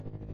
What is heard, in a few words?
buzz